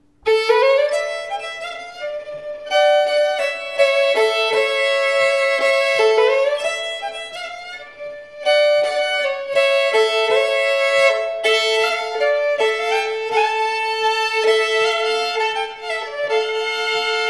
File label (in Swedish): Sarna gamla brudmarsch